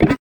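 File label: guitar; fofix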